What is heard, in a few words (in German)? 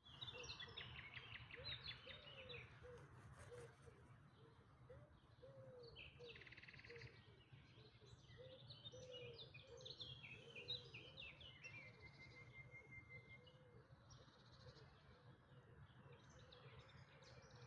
Dawn Chorus, die Mönchsgrasmücke und die Nachtigall gleichzeitig zu singen; Berlin; Auffallend waren für mich in der Aufnahme die vielen gurrenden Ringeltauben; Der Dawn Chorus 2025 fand wie jedes Jahr im Mai statt